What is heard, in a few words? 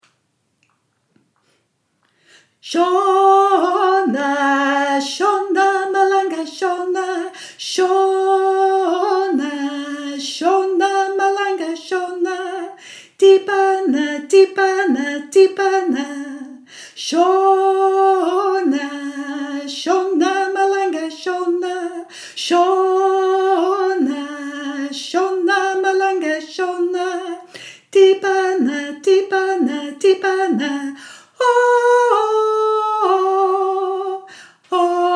alto, shona malanga; sing for water 2020